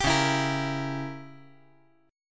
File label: Db Diminished 7th Db down; strummed; JGuitar